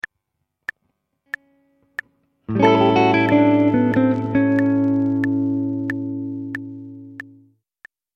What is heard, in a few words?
blues